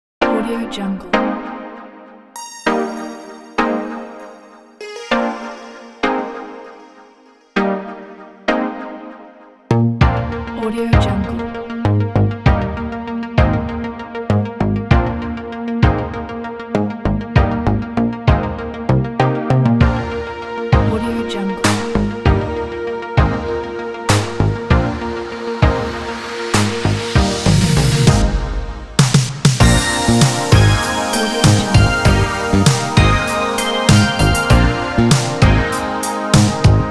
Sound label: synthwave 80s